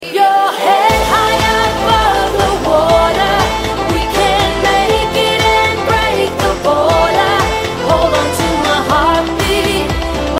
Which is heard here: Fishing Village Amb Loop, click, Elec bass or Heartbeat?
Heartbeat